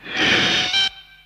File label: Roar; Kamoebas; Showa